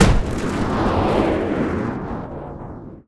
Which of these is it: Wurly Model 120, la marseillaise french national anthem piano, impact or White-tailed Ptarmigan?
impact